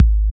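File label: TR-808 BD, bass drum; Roland BK-5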